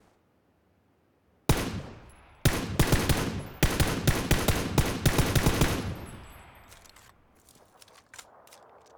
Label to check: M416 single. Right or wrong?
Right